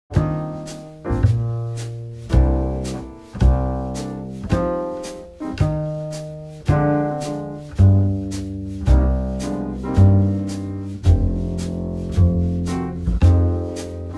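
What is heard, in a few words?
Jazz Root Five Swing 110; jazzu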